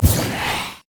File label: move quick; LoL; teemo